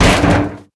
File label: hit